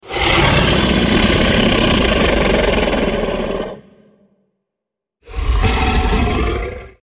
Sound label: grumbling baryonyx